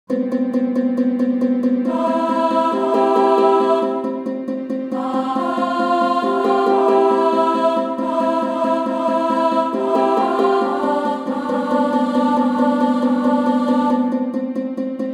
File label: alto